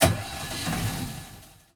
vehicle yacht ignition; teardown ps5 windows xbox series xs gamerip 2022

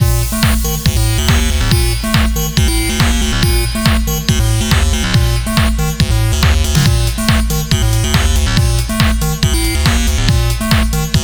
Wave Runner - 140 BPM

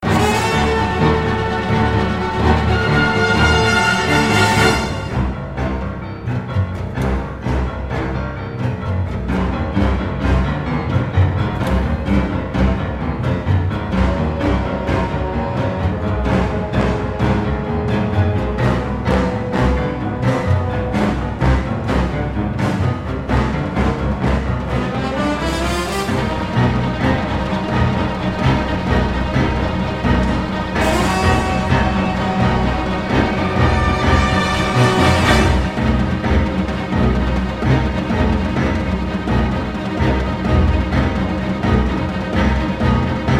LOOP music wizard; Hercules

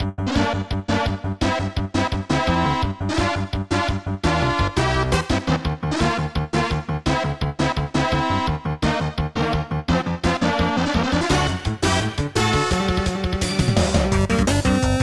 dogfight; TS Underswap

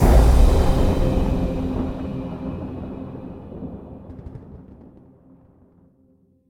Combo C

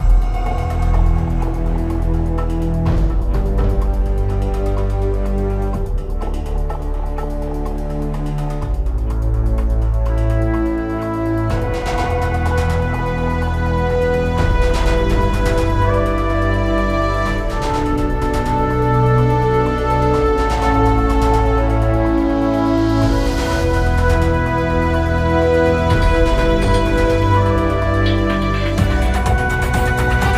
ui menu; SOCOM U.S. Navy SEALs Fireteam Bravo 3; PSP

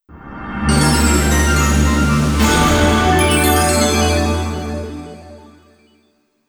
ジングル NEWS; EDF1

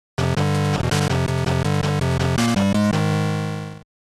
Results (Fail); Road Champs BXS Stunt Biking; GB

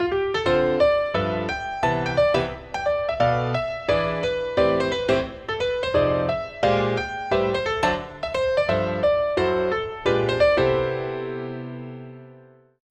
Wineglasses; A Dance of Fire and Ice

